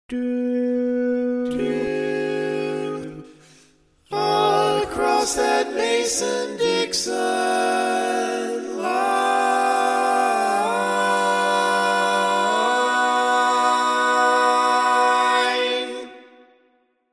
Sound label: Tenor, barbershop